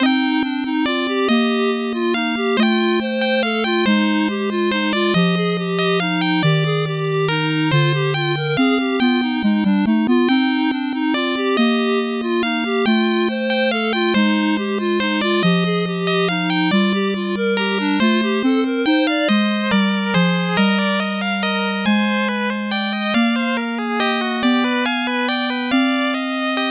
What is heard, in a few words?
8bit bgm normal